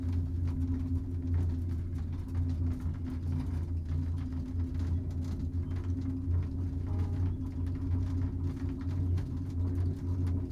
Cable Car Ride

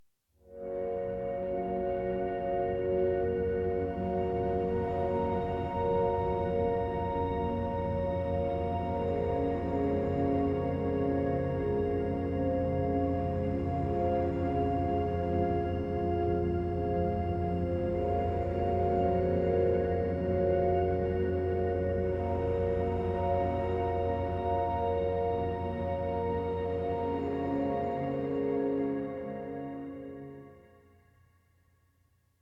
Space And Time Music

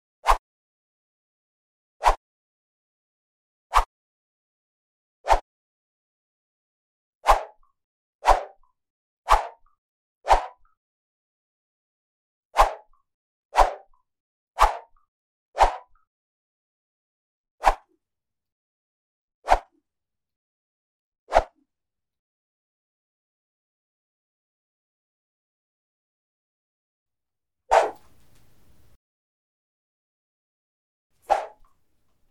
Jump rope Whoosh Sound

